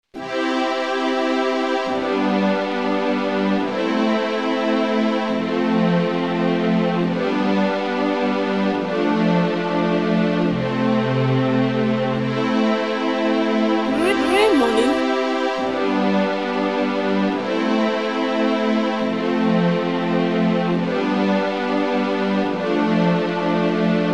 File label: instrumental